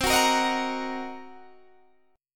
C Minor 7th Flat 5th Guitar DADGAD, chord

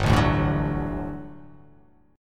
G Major 7th Suspended 2nd G down

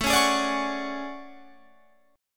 A Major 13th, down Guitar Open F; AM13 strummed

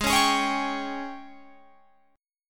Ab Major 7th Suspended 4th Ab Guitar Open F; AbM7sus4 strummed; 20,20,20,20,22,20

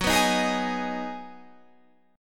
B 6th F sharp, guitar chord

